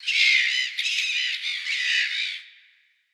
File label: ambient sea birds